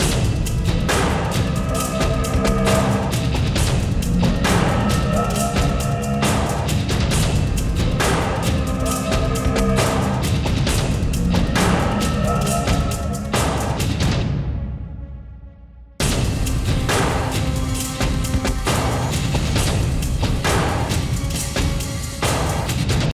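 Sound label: Music Demon Arena Combat loop; Hunted Ch0 Combat v2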